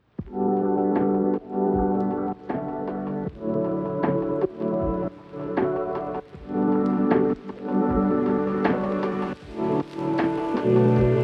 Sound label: lofi hip hop